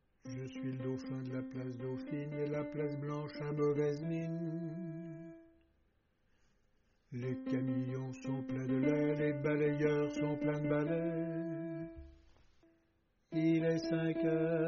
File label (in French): hommes; Tenor